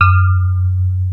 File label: Electric Pianos; Wizoo Electric Pianos